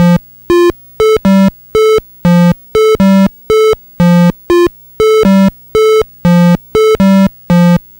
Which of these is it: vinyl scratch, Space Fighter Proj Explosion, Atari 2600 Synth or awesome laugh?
Atari 2600 Synth